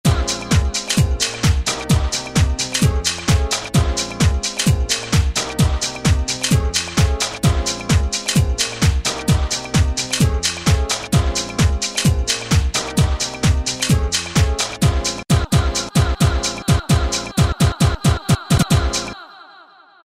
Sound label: 130 PlayBndr, House Collection; Roland SR-JV80-19 House Collection